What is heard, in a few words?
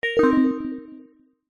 game sound soft warm digital tone positive select